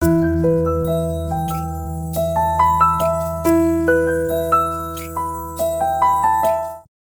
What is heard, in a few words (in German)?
Klingelton